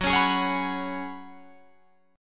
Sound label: Gb Suspended 2nd Suspended 4th, Guitar, Drop D; down; chord shape